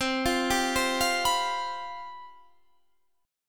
C Major 7th Suspended 4th, C, down, Guitar, Standard; arpeggiated; CM7sus4